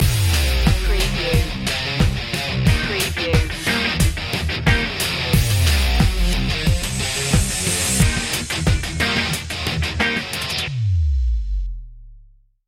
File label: slow jam rock stinger